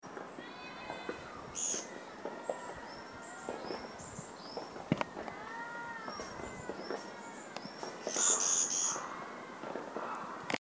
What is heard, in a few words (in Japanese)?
kogera, tori